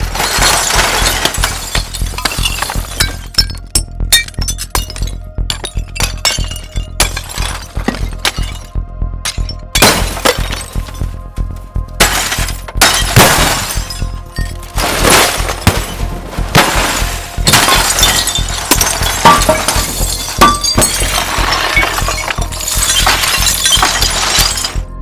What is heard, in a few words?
Shards; SFXtools